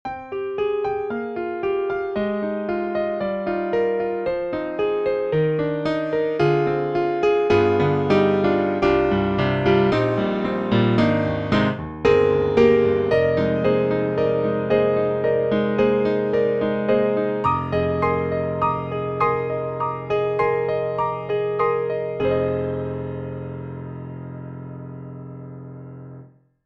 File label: Concert Grand Grand with Overtones; New York; Native Instruments